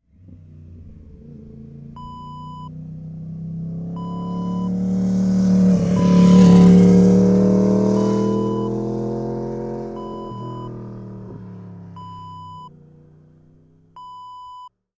motorcycle pass by

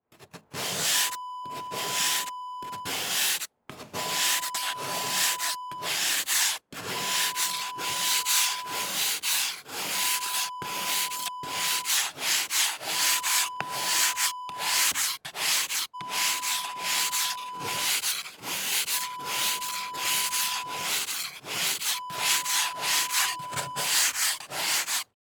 scraping ice

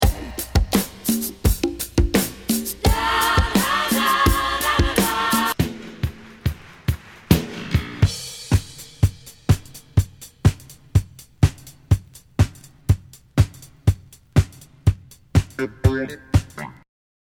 breaks

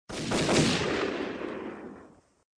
Echoed gunshots

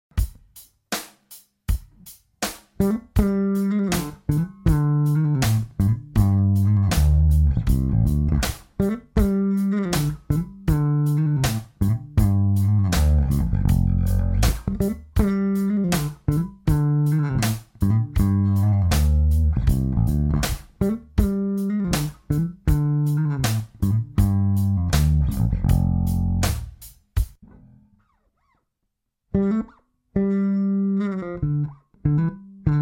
Dm Am pentatonic fill

